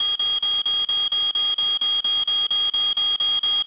emergency beep

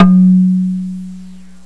gong

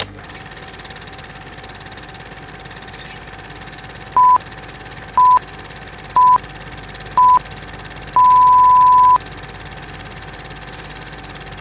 projector with beeps

